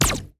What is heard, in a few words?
Rapid Laser Fire